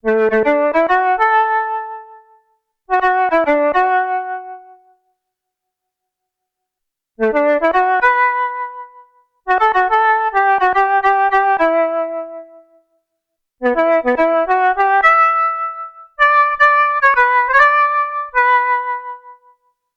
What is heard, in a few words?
clarinet